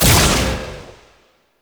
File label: Proj Hit; Warframe; Lizzie